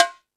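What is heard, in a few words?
Jungle Percussion, Drum Machines; 300 Drum Machines; E-MU Pro-Cussion-1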